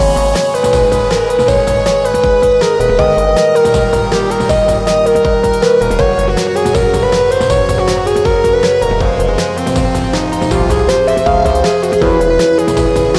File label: loop